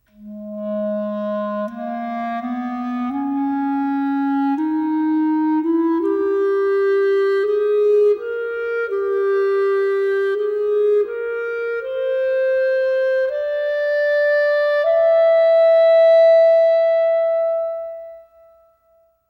clarinet bridge

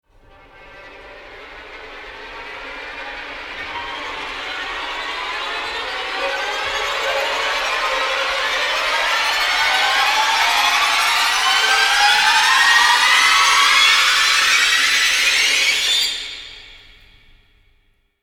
multiwave rise

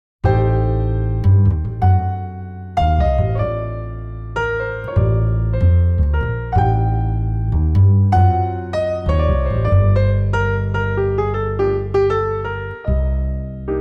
jazz lounge version

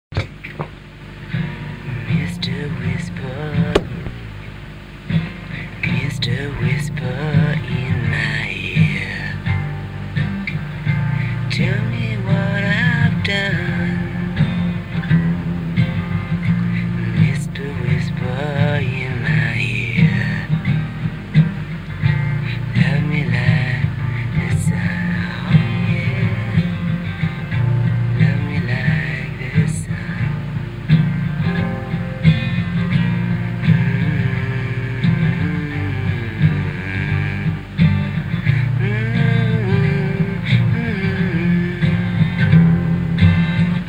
acoustic

